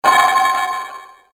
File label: harmonic event